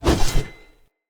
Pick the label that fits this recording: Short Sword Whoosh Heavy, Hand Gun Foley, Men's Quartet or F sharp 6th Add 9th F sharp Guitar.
Short Sword Whoosh Heavy